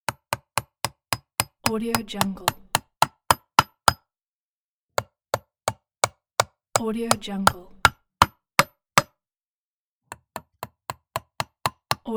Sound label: hammering a nail